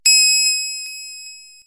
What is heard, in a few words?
Mining Ping; pokerogue; battle anims